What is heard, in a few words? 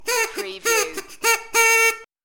Party Horn Riff